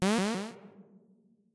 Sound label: line alert; Adventure Time - Angry Betty; ThrillGames